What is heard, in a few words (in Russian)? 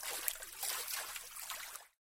vsplesk volni na beregu okeana